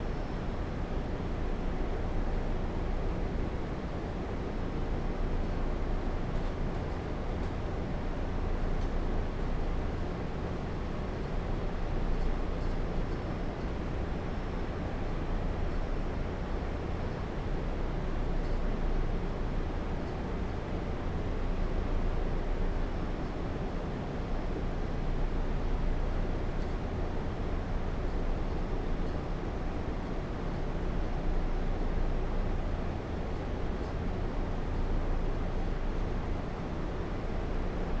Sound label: Freezer Section In A Small Grocery Store